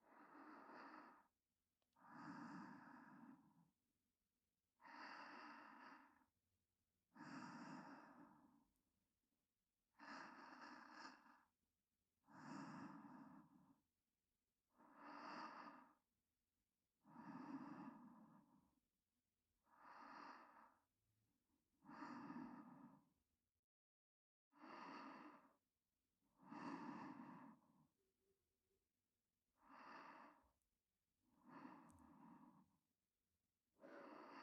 寝息右; 撫猫さんと添い寝

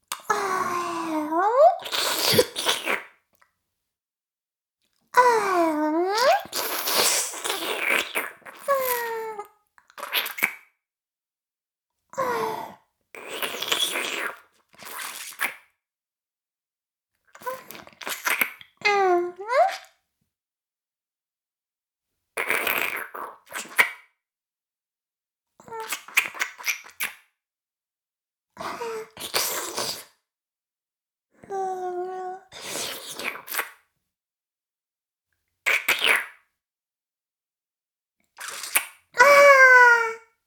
フェラ音声; おにいちゃんアイスぅー！; 妹

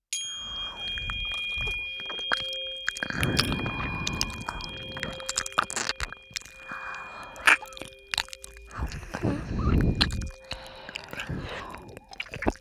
音叉 ＋ 耳なめ; エンドレスa

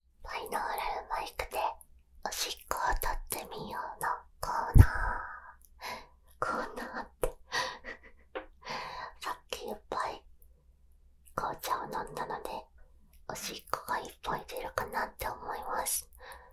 おしっこ; ２発射目

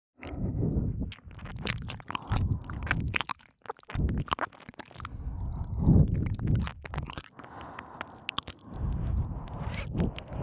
おまかせ耳舐め両耳; 耳舐めコレクション

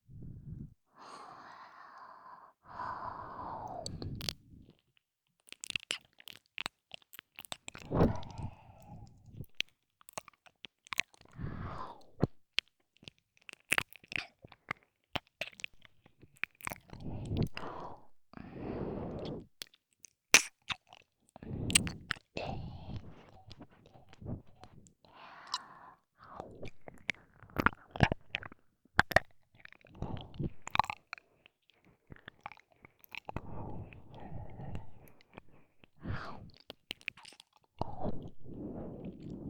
耳舐め（右耳）; ループ用音声; 純真無垢なティナの癒やしの魔法; バイノーラル音声作品

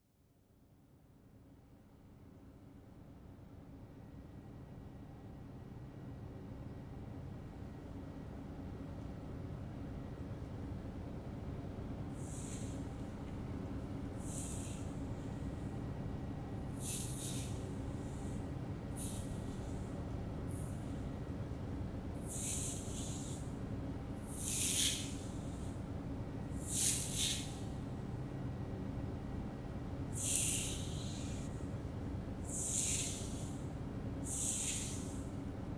お耳に水星スライム（スライム・吐息）ASMR

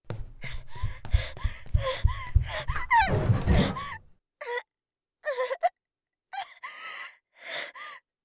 逃げようとする; 効果音あり

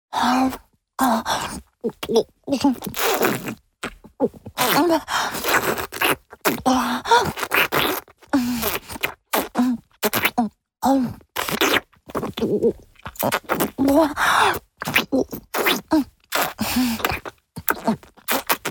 チュパ音, 高音抑制; フェラチオ(強):頭を上下に激しく振って陰茎を深くしゃぶる

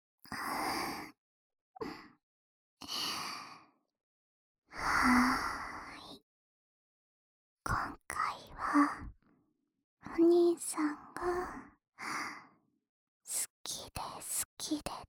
耳舐めループ（右耳）, ASMR; オナサポ用

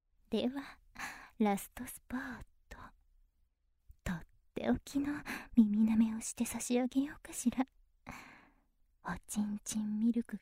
耳舐め射精END

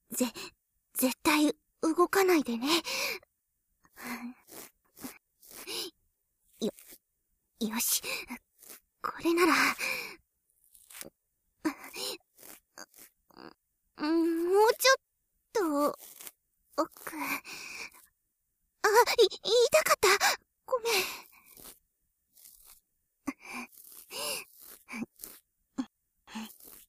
右耳 耳かき

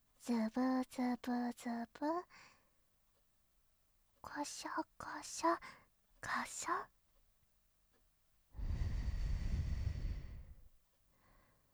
ずぶこしょ耳吹き

